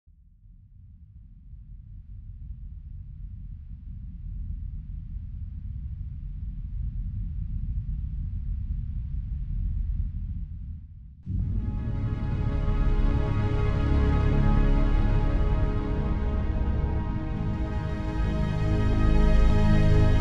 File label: Dusk Horizon (Loop); beta unreal